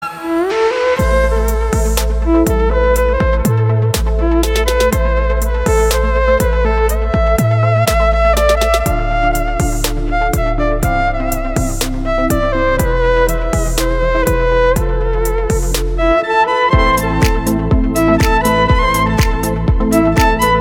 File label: Violinist